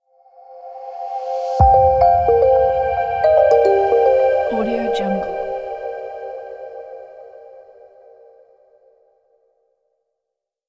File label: ambient logo; soundbit